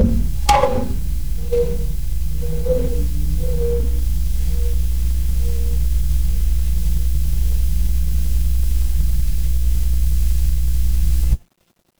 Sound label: ambience room tone